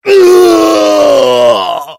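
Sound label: heavy pain critical death; voice packs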